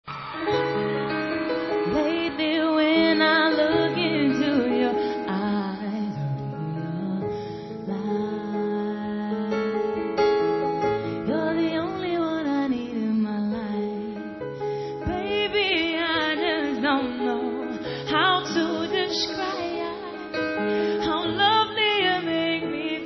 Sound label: unplugged version